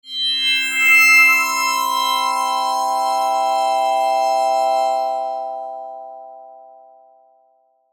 Logo Bright Shine; Sonic Logo; Blastwave

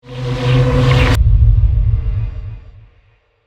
Riser To LFE; Ascends (Sound Effects:Sound Design); BlastWave

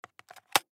Clipboard Paper Set Down; Office; Blastwave

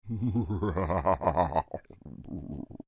Creature, Human, Devilish Laugh; Evil Laughs; Horror

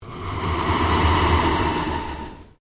PULSE LONG SWEEP

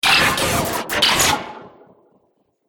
Laser Future Droid Repair; Sci-Fi; Andrew Fleming

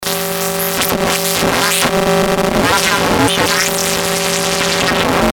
static digital; Static Digital Warble Stutter Crackle; Production Element Imaging Element Accent Transition; Blastwave

